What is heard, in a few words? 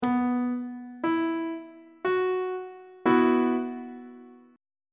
c flat suspended 4th chord; on treble clef